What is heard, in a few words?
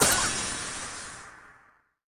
Monster Death Gas